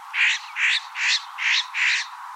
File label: weep